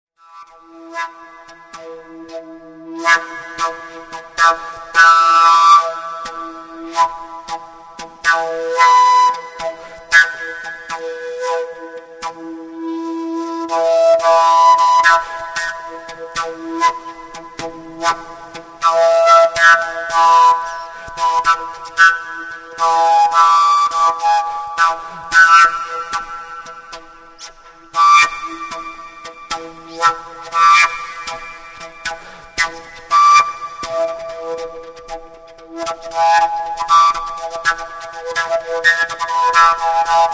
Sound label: Overtone Flute